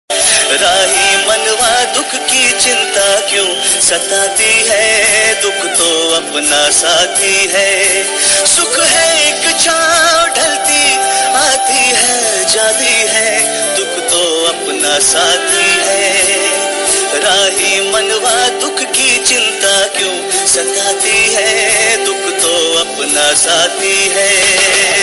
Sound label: sad song